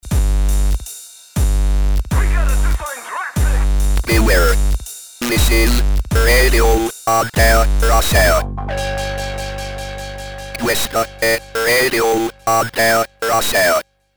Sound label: jingle